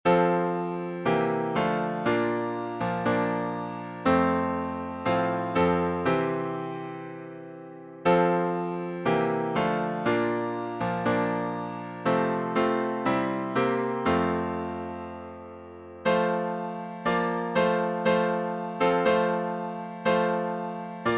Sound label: Hymns